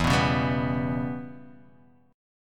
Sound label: E Minor 6th, Guitar, Half Step Down